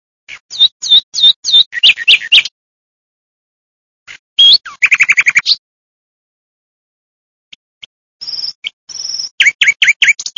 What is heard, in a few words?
USIGNOLO NIGHTINGALE ROSSIGNOL NACHTIGALL; Canti degli uccelli